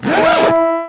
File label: Boss Hit